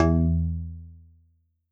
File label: bass E